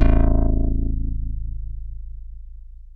ANALOG, BASS; Best Service; Hyper Dance

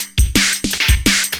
loop, 170 BPM, drum & bass; Ueberschall; Drum & Bass Flash Trackz

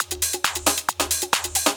Groove 135; Dance Mega Add On Grooves

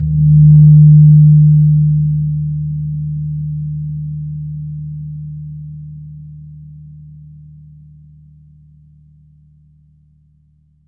GONG D2; USB Soundscan vol.60; Asian Traditions; AKAI

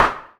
snare mod

